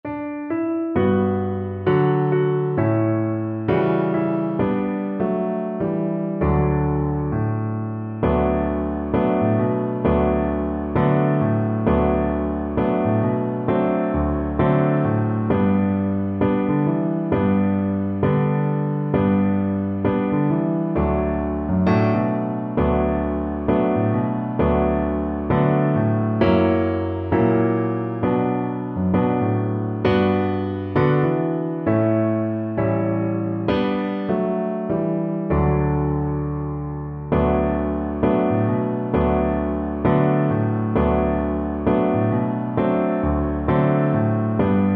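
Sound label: viola karaoke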